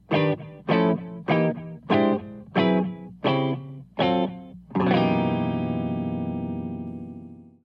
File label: Riff